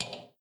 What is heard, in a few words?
metal ladder footstep